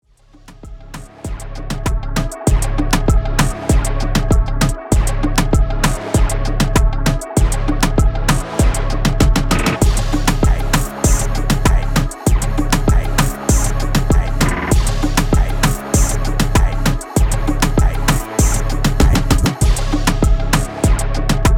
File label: Latin Melody Loop C 98 BPM